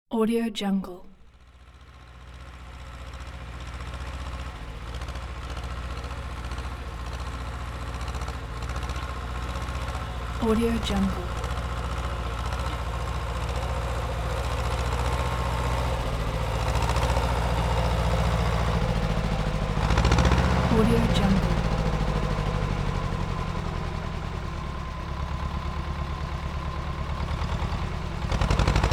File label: tractor ploughing turning back; عبور تراکتور از زمین کشاورزی; industrial sounds